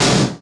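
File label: Collision Interior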